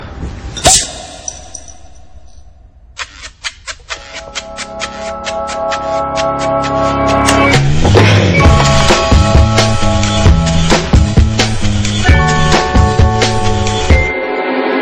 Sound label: Music Tone; Coke New